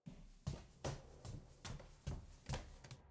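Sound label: ambience generic sounds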